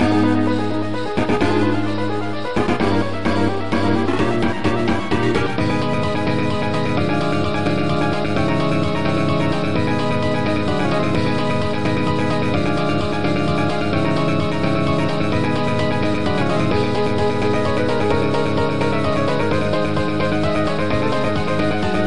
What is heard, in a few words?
death battle; svencoop; sc castlevania